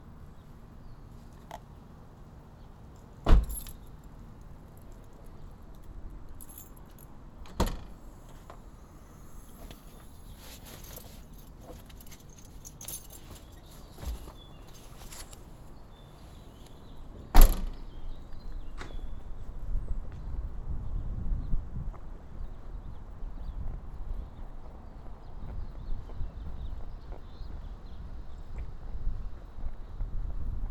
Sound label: Door to Door, binaural